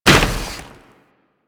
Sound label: Combined Step Snow, Footsteps; Enemies; Warframe